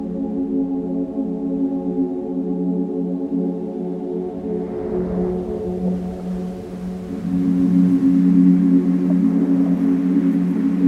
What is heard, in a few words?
NATURE